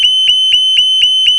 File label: wrong code